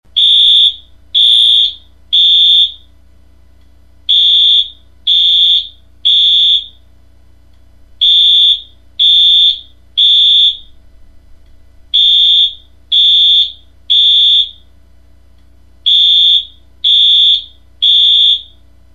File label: drill